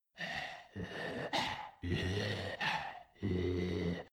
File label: zombie male idle; zombieclaw; npc